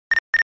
Sound Low Battery

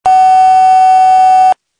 ACB Tone

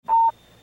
Sound Failsoft

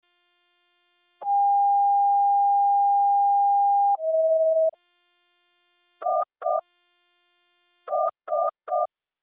standard ems fire

